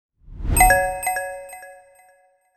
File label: ringing complete sound notif high